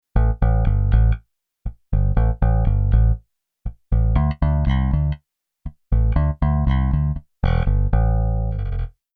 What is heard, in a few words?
bass disco bass